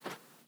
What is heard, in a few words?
Gentle Swish, Organic Wooshes; Micro Pack